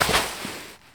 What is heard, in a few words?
Baseball Dive Dirt